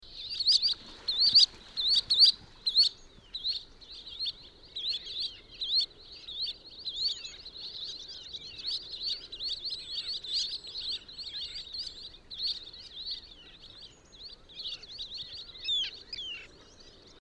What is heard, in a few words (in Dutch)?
Boerenzwaluw Hirundo rustica